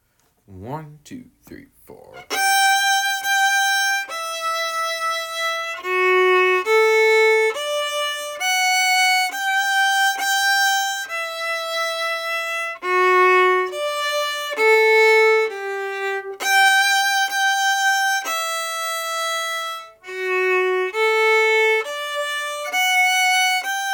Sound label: cat out of the bag harmony, fiddle